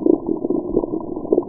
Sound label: Stegastes acapulcoensis Croak